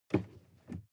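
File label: sports car interior pedal brake short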